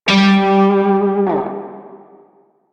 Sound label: Shawzin Flourish Oct Two G; Music; Warframe; Narmer Shawzin